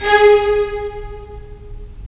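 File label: strings violins, right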